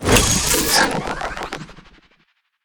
call drones; wilbur

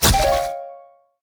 object spawn; angry gnome

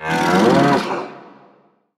enemy minotaur charge warning

